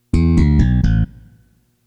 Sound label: bass